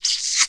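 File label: AMB China 3D Ambience; gamerip; rabbids multiverse ios macos mobile gamerip 2024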